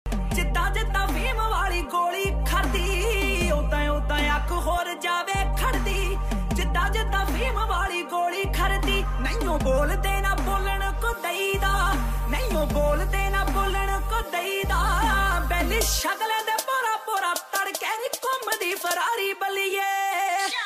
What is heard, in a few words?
punjabi ringtone